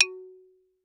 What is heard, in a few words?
Xylophone rosewood ff Gb4 stereo; MIS Pitches - 2014; Percussion